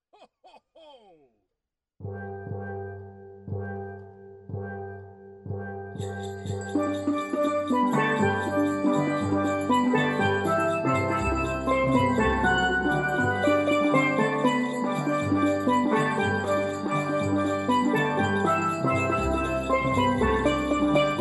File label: Jingle Bells (steel drums)